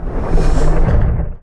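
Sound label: slider close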